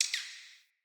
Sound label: Bird Double Chirp; Paragon Bird